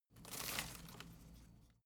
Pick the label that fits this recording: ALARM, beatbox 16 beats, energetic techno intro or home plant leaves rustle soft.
home plant leaves rustle soft